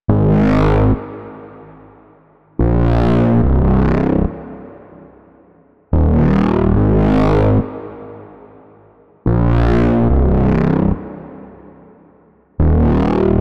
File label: 144 BPM C Min Bass, loop stems; Cymatics; Solar Eclipse; 2022 Melody Collection Platinum Expansion